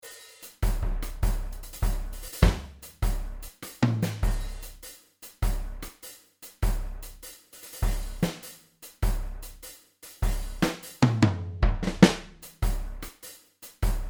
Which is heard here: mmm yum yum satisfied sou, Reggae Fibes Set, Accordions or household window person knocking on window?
Reggae Fibes Set